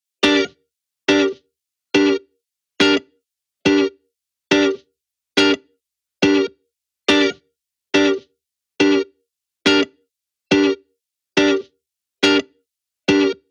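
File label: 140BPM G minor Guitar DRY, Offbeat Dub Guitars; Antidote Advent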